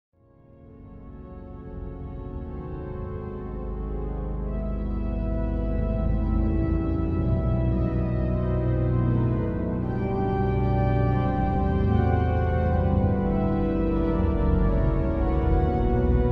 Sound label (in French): Choral mi bemol majeur, orgel symphonique